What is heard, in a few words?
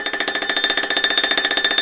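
YANGCHIN tremolo; INIS - Opium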